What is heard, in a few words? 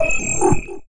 alien game ui glitchy sci fi bleep digital short laser